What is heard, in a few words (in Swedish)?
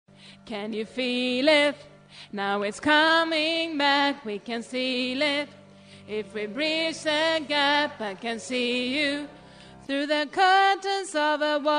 alt, stammor